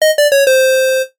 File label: Lose Sound